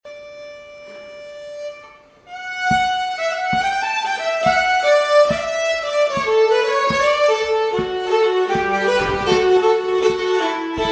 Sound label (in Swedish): Polska fran Sormland; slangpolska southern